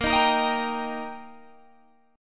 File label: G Minor Sharp 5th Bb, chord